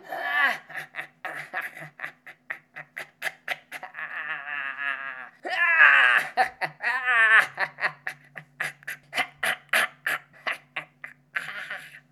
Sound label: scissor farmer sing; Hollow Knight: Silksong